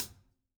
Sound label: Hat